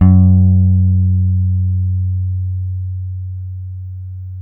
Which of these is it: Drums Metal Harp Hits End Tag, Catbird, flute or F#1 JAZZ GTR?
F#1 JAZZ GTR